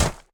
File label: footstep; Smite; Baron Samedi